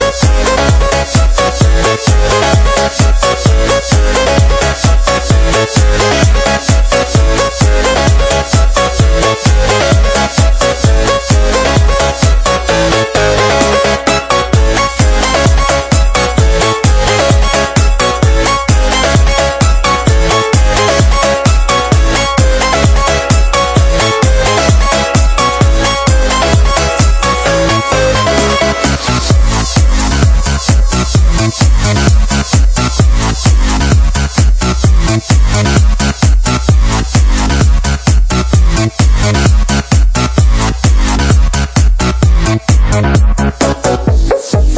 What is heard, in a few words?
original extended instrumental mix, disco